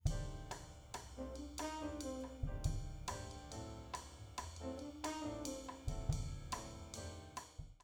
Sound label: Toms Mix Proc